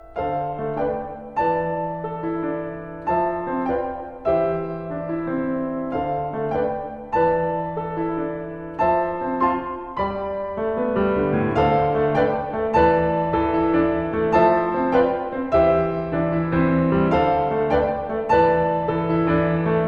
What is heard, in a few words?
allegro (piano)